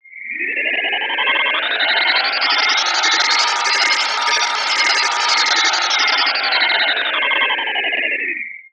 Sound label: Retro Anime Sci Fi Sound